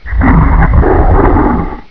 drown; quake2; ogre

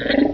drown; quake2; raptor

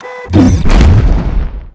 pain; quake2; battroid

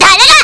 pain; quake2; hottie

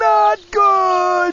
gurp; quake2; spongebob

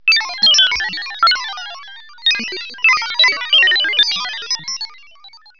robots; quake2; sven

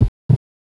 heart; quake2; inland

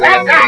jump; quake2; martian

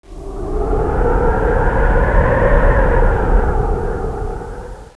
wind gust; quake2

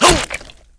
pain; quake2; ufops

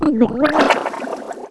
drown; quake2; rei

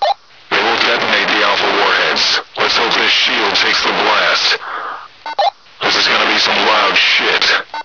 nuke chatter; quake2; kitsune

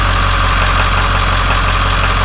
diesel; quake2; kitsune

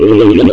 pain; quake2; sorb

